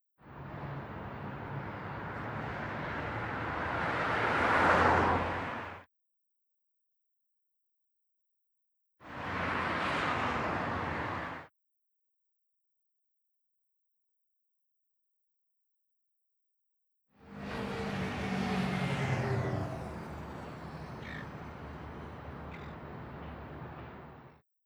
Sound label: Golden gate bridge Cars